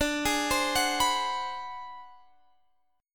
G sharp 7th Sharp 9th Flat 5th

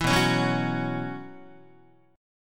B Suspended 2nd Suspended 4th B Guitar, chord; strummed; JGuitar

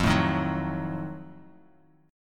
F 7th Flat 9th F down; F7b9 strummed

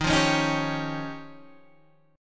F 7th Suspended 2nd Sharp 5th

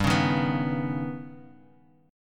D sharp Augmented 7th G, Guitar

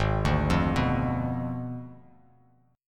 Ab Diminished 7th Ab; Abdim7 arpeggiated; JGuitar

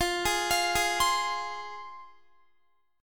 F Minor, down, Guitar; arpeggiated; 4F,4A,5C,5G,5C,6E

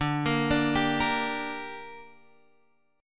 D Suspended 4th Sharp 5th D down, chord

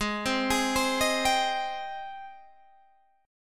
Ab Major 7th Ab down Guitar Standard; AbM7 arpeggiated; JGuitar

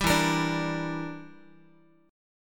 E Minor 7th Flat 5th E, Guitar; strummed

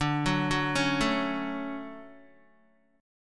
D Major 7th, Guitar; arpeggiated; JGuitar

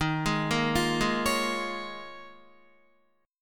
Eb 7th Suspended 2nd Suspended 4th Eb, Guitar; arpeggiated; 11,11,8,10,8,8

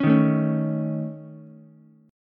Db Suspended 2nd Sharp 5th, Ukulele, chord; strummed; Dbsus2#5; JGuitar

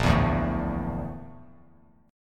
Bb Major 7th Flat 5th Bb Bass Standard; BbM7b5 strummed; JGuitar

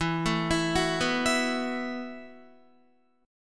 E Suspended 2nd Suspended 4th E, down, Guitar; Esus2sus4; arpeggiated

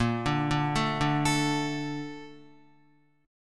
Bb Major 7th, Guitar; BbM7 arpeggiated; JGuitar

